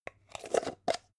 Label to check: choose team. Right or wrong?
Wrong